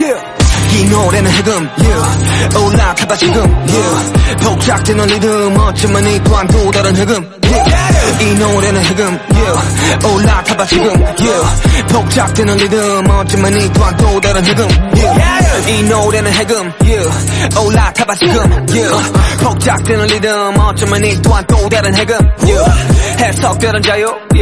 Haegeum Song Korean

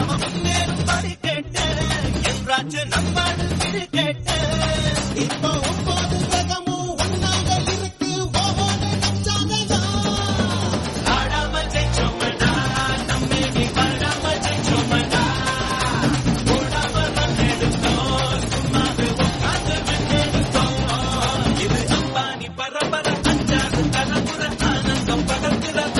Tamil Song Ringtone; Mankatha

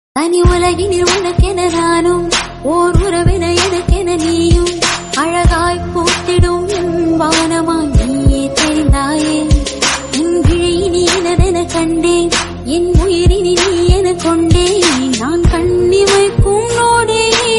Tamil Female Version; ringtone; Irumugan

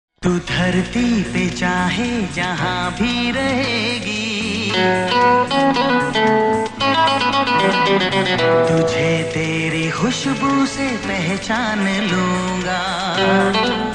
Hindi

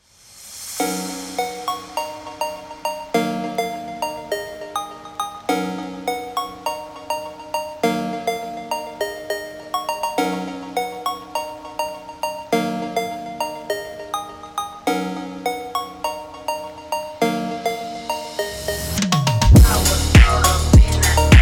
Slowed; Ringtone

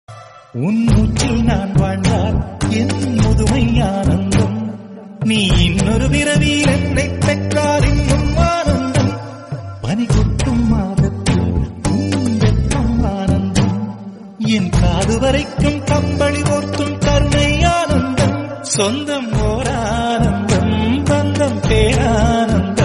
Tamil Song

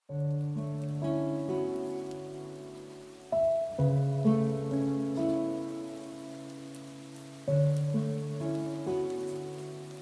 Piano Music

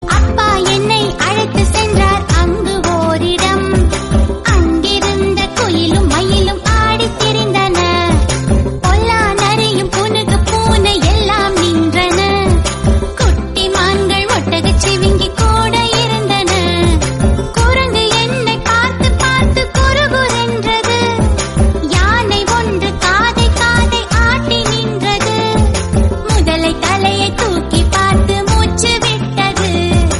Father Love Tamil Song

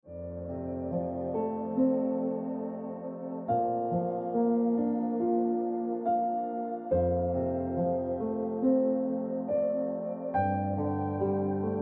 Tonic Note Piano Music

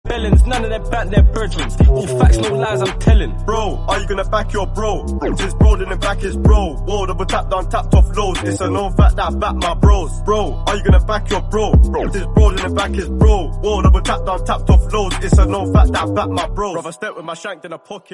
Freestyle Tamil South Indian

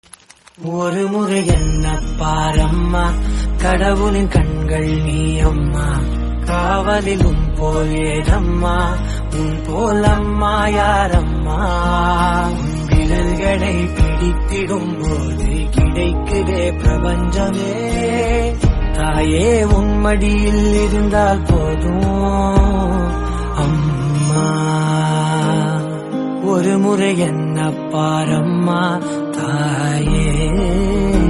Amma Song Tamil

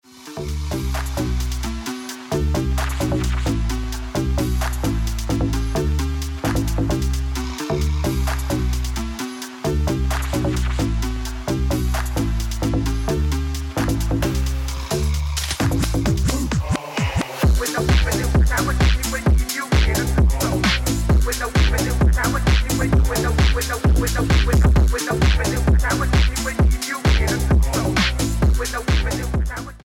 Pop Phonk Dope Ringtone

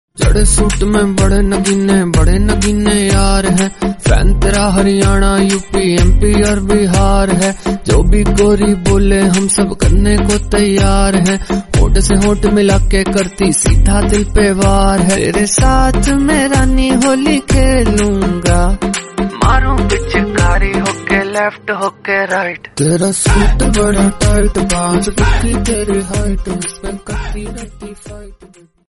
Instrumental Hindi song

